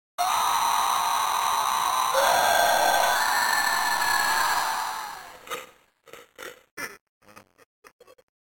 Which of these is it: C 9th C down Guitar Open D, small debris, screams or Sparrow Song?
screams